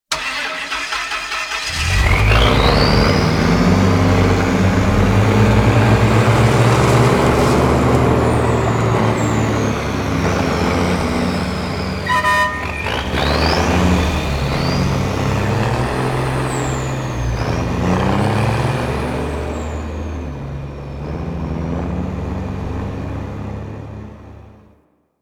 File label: truck; Warframe; NineteenNinetyNine; Stage8ShitboxConvoy